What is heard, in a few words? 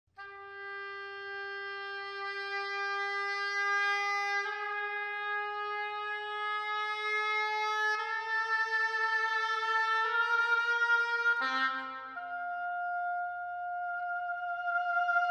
Oboe Solo